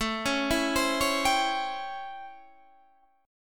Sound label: A 7th Sharp 9th A, Guitar Standard; A7#9; arpeggiated; JGuitar